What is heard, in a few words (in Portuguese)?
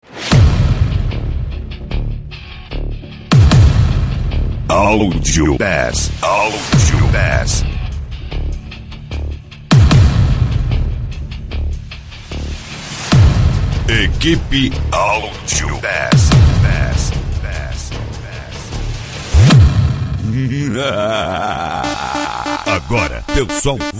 abertura audio bass especial pancadao